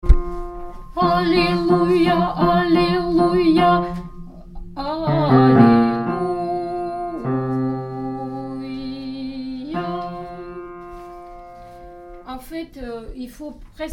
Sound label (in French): Alleluia ton4 Basse; Etude des tons liturgiques slaves; Voix basse